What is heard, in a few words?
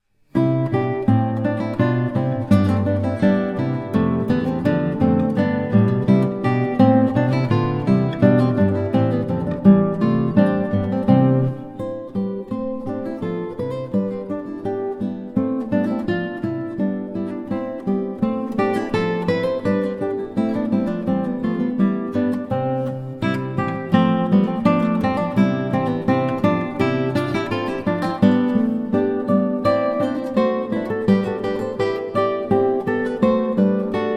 Gavotte, Trios; Handel Alcina